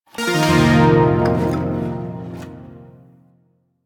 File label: Shawzin Un Equip; Zariman Shawzin